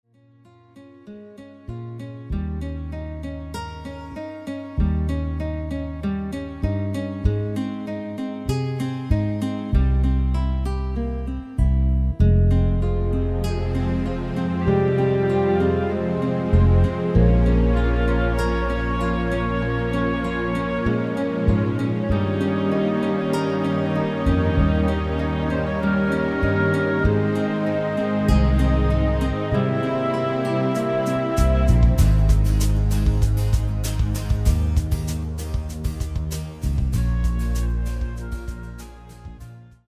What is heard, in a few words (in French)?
instrumental